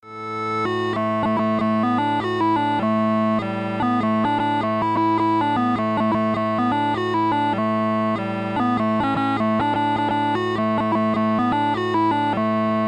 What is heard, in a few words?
Jig